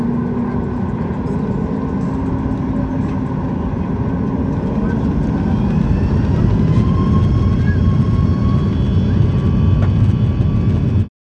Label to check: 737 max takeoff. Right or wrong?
Right